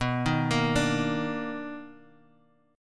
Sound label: B Major 7th Sharp 9th B; Bmaj7#9 arpeggiated; JGuitar